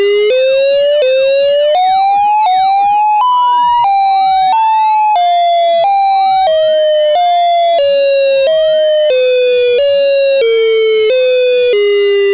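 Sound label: Alternate Wail, outdoor warning siren; DSA: Directional Speaker Array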